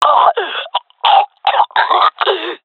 death, jungle fem; vo; agents; Source Model Packs; Counter Strike Model Pack; CS2; jungle fem epic

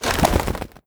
wings hop, pigeon; critters

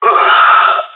ct death; Counter Strike Model Pack; CS:GO; gsg9

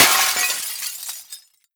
break glass phys medium; physics; breaking

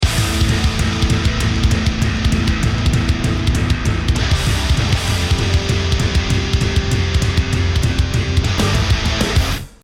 bomb ten sec count

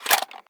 ssg08 clip out, weapons; Counter Strike Model Pack; CS2

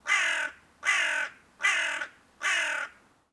crow call

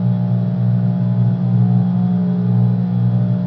garage, ambient tones

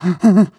breath short, zombie

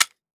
scar20 clip touch, weapons; Counter Strike Model Pack; CS2

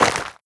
scuff, footsteps, player, dirt

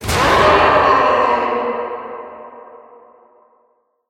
zombie intro yell; subway; scripted sequences

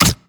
bite, headcrab; reviver; creatures

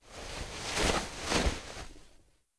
vort beckon, foley; Half Life 2; Episode 2

